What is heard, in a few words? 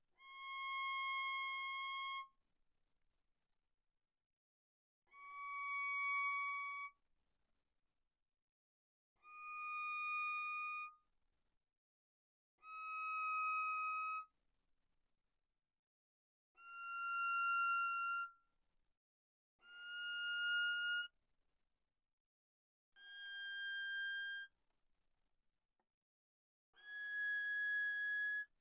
Viola arco sul A mf C6 Ab6